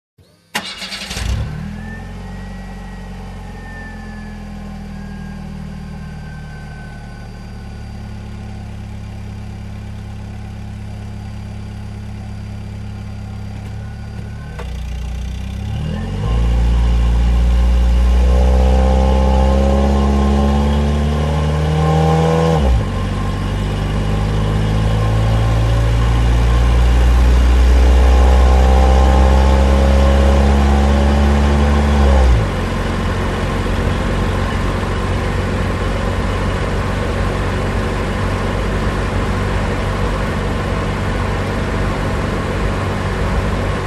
Грузовик заводится движется глушится Truck engine starts moves Muffle the engine